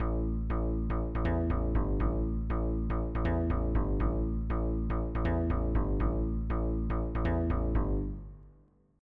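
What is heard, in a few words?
synth bass; Roland - An Invitation To The Roland World Of Music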